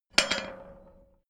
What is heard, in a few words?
small metal drop; industrie wirtschaft; metall